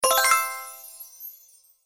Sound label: win Regular; slots; Paradise Escape